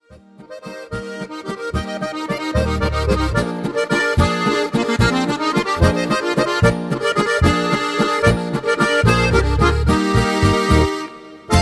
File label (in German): Landler